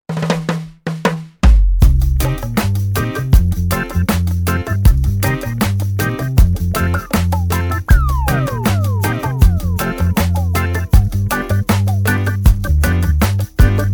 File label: Reggae Groove